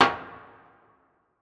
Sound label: metal impact